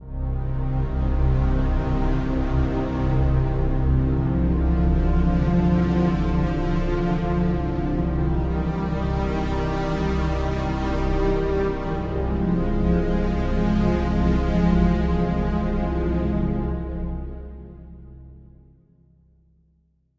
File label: Music Strings In; Void Bastards